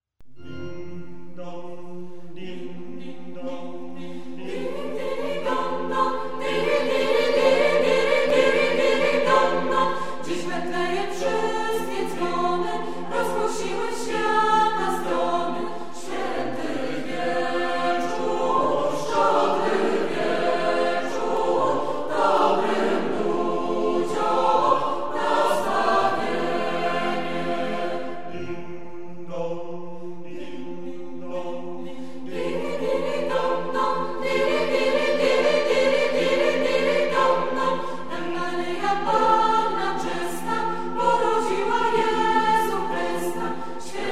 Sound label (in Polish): Dzwony w Betlejem